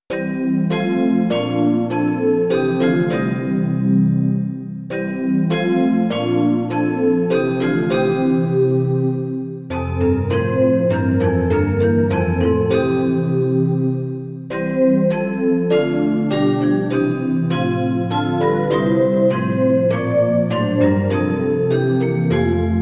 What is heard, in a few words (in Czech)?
syntezátor; Ó sešli pravdu svou; Imaginativní verze